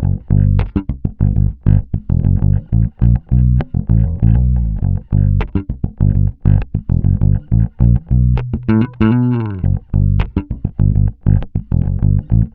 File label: Bass loop 100 Bm, Electric Bass; Electric Bass Loops; Slate Digital BASSHOLE Sample Pack